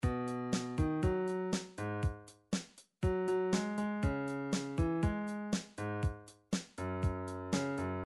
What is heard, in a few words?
drums